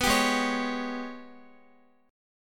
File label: G sharp Minor Major 11th, Guitar; strummed; JGuitar